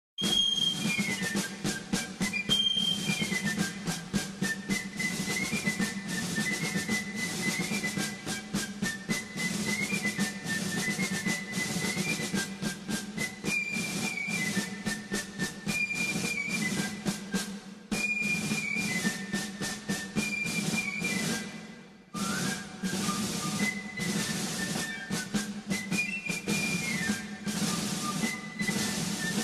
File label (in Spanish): Marcha Militar Intro